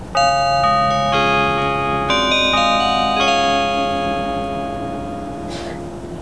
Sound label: chime; 名鉄1600系チャイム